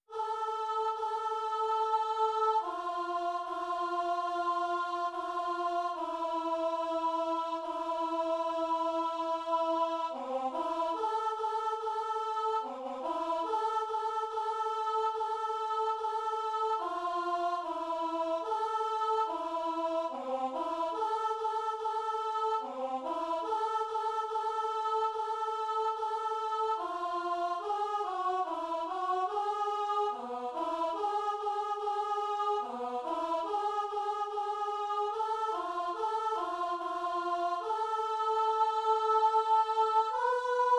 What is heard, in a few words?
alto; Shlof, mayn feygele